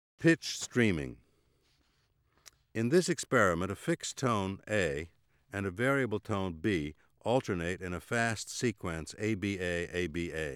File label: Pitch streaming, Auditory Demonstrations